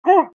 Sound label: pain sharp; pyro; Garry's Mod; tf2 content